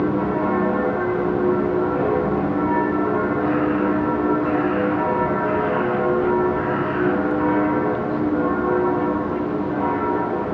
Bells Distant Berlin; Sound Scapes

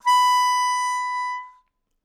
Melodica Long, Long Notes Chromatic

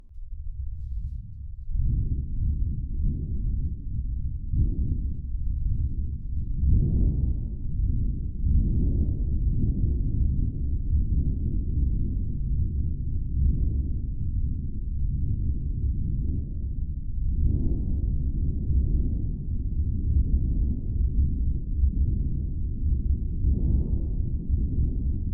Storage Heater

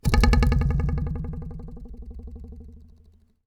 Ruler

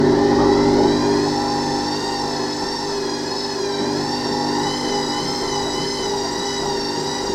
Whirr, washing machine; Wash Spin Cycle

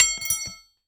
Aluminium Tube Drop

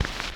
Click, vinyl individual scratch sounds

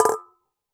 Coin On Collander; Coins

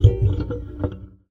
Classical Guitar Extended Technique; Classical Guitar Extended Technique Mono

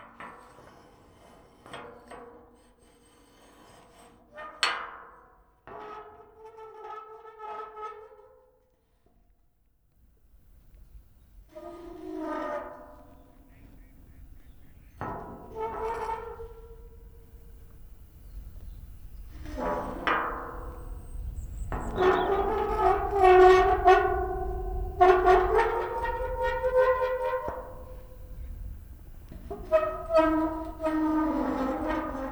Gate

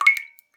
Drips

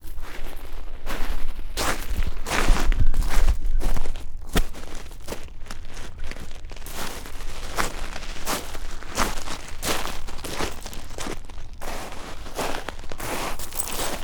Footsteps On Shore; Oxford Island Lough Neagh; Sound Scapes

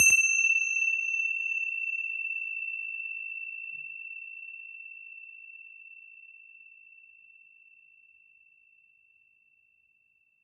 Tibetan Cymbals; Percussion Samples

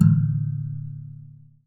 Classical Guitar Extended Technique Plink

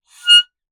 Melodica Short Note

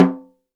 Snare Drum; Percussion Samples

